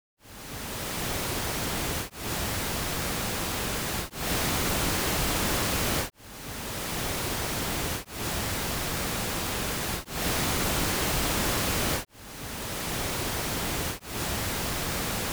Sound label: Phantom Image Test